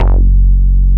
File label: HOLLOW BASS; Masterbits; Dance Trax